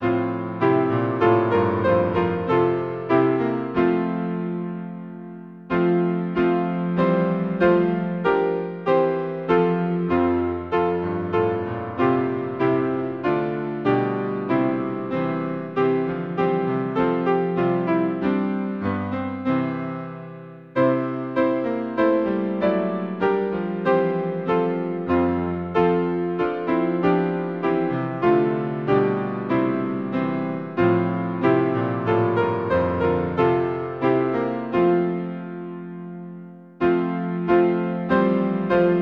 Hymn, piano, Alles Ist An Gottes Segen; Come pure hearts in joyful measure

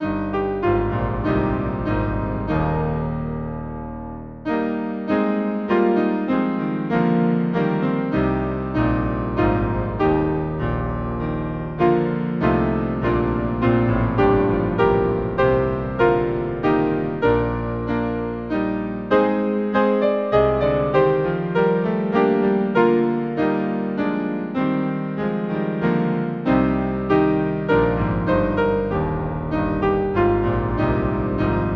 Hymn, piano; Be thou my vision; Slane

